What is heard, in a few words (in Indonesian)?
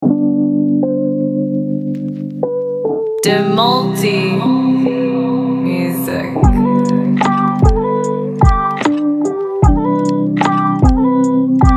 lofi, membalut nuansa lofi yang hangat dengan potongan vokal humming yang lembut